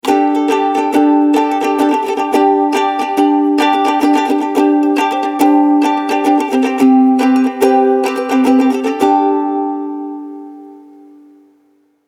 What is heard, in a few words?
ukulele logo